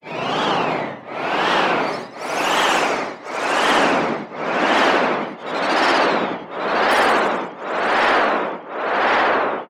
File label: Cosmic Transitions